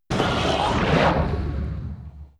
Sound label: Monster Synth Snarl